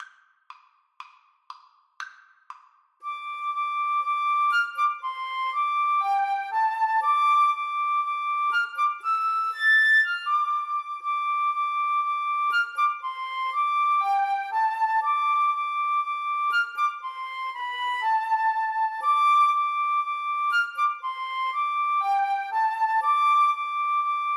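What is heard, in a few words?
flute duet karaoke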